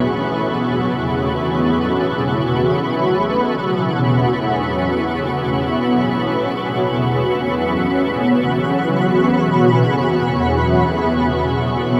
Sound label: Guitar Textures